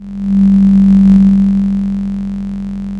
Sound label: Sound of a cruise ship horn